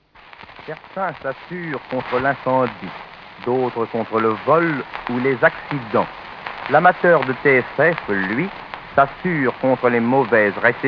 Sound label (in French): lampes